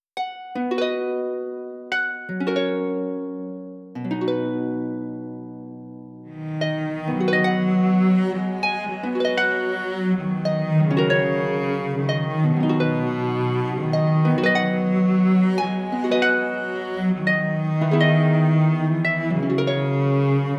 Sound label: Instrumental